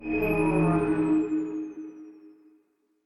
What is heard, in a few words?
Magic Enchantment